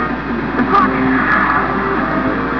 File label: rocket